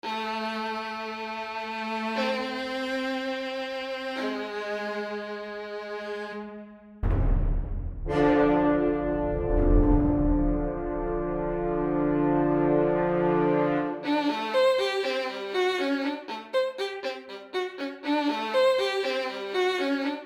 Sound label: Symphonic Orcestra thingy